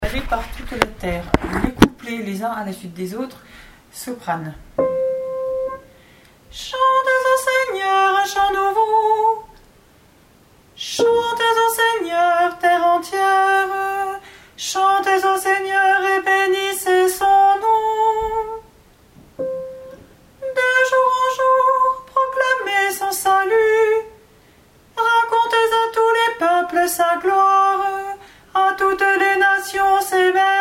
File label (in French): SOPRANE; Allez par toute la terre COUPLETS